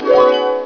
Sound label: harp